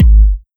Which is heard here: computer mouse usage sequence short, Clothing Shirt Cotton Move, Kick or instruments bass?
Kick